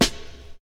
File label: shiny acoustic snare sound c key, Royality free snare drum sample tuned to the C note